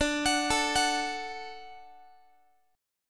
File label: D Major D down Guitar; arpeggiated; tuning 4A,4E,5A,5D,5G#,6C#